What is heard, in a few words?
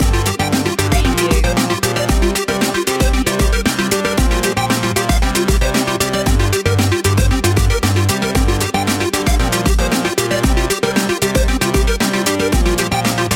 electro circuit loop